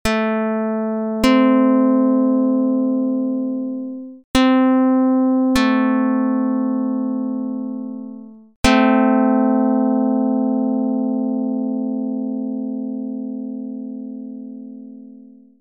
pluck; Jid 13 11; dr220

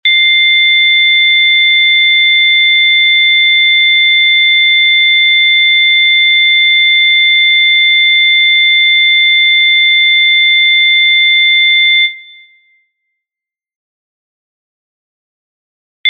Interval Test

